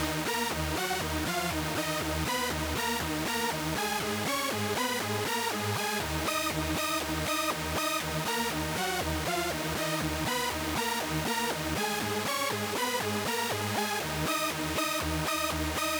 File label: Stabz